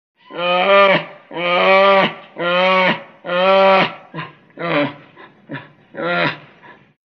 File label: Bear Unknown Type